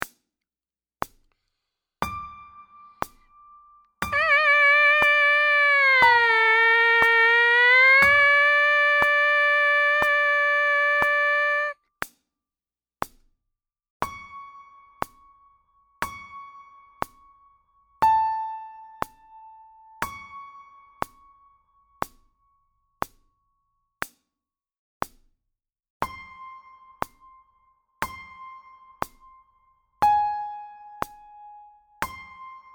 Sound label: Mpc buzz; trumpet; Beginning Trumpet